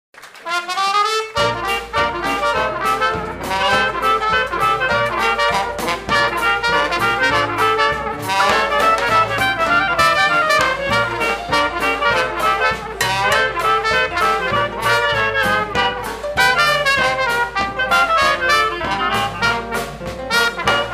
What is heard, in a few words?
1920 music